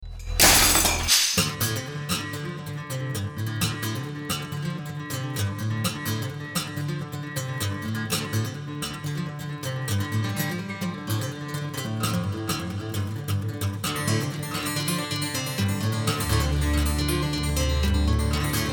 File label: Fast Riff